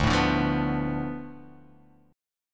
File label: D Minor 6th